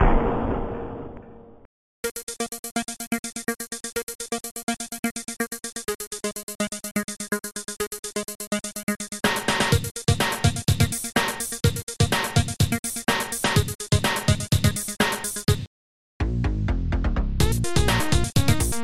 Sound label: rimshot